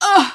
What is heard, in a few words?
pain, female; npc; Garry's Mod; hl2